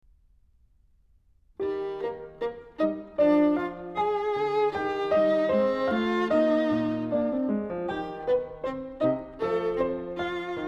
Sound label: Violin Sonata in E flat, Allegretto (Con Sei Variazioni) Tema; Mozart; K481